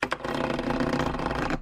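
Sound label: sounds of scratches on metal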